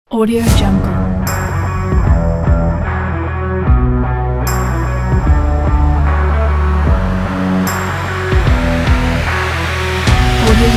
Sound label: energetic sport upbeat rock